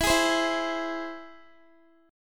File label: E Augmented, Guitar; strummed; x,19,18,17,16,x